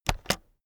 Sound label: keyboard external space press slow heavy